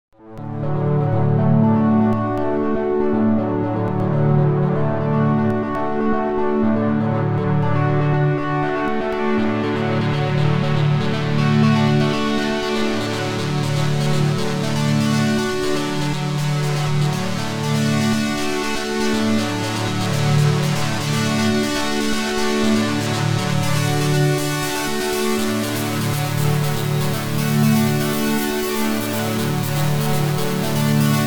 Preenfm3 FM Synth Wrap amp and wrap Reverb

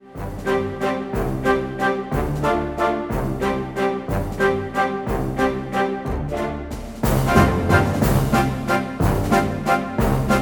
Yamaha Genos Style Jam

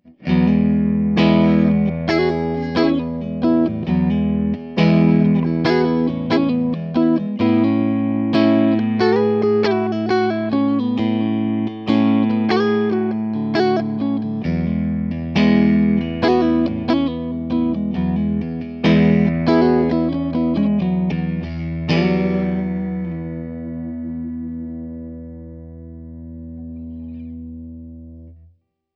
Neck Middle PU Clean; Charvel Marco Sfogli Signature

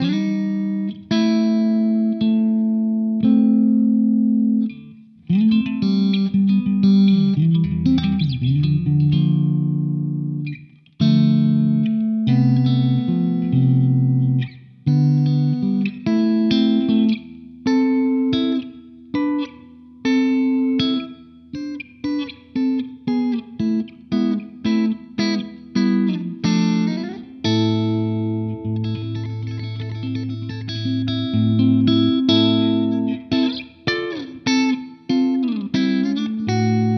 D'Angelico Bedford Deluxe Clean Neck

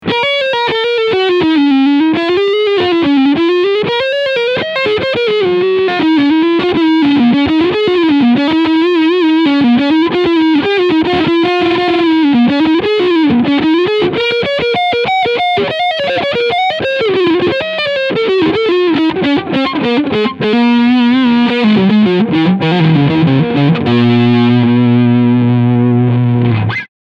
Extreme 76 BK Lead Sound Front Humbucker; Harley Benton

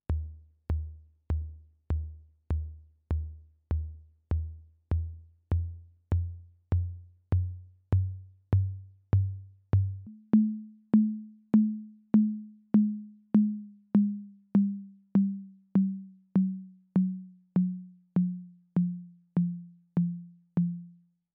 Low Tom Conga

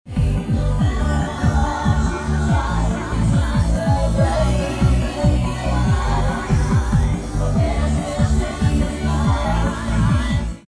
Instrumental

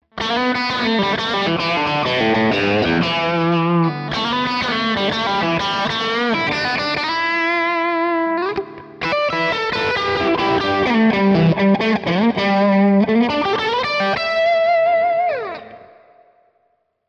Ibanez AZ226 Bridge PU splitted Crunch

